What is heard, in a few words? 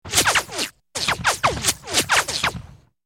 Distant Multiple Bul, bullet bys